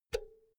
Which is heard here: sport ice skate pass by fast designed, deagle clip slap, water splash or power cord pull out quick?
power cord pull out quick